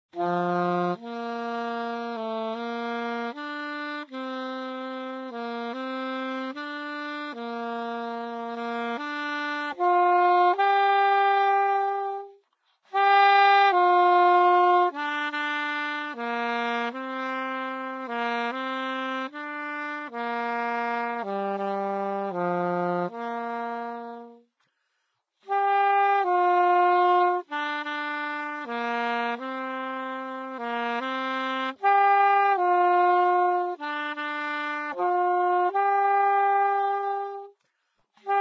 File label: Saxophone; Zomboid; Lifestyle